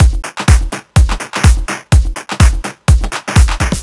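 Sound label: percussion, Drum Loops 125bpm, Nu Dance Electro; Chicago Vibe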